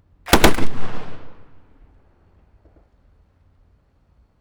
M1918 Browning Automatic Rifle .30cal 0.1m to right Double shots